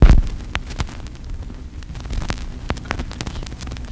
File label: VINYL NOIS; USB Soundscan vol.03 - Pure Electro; AKAI; AMB FX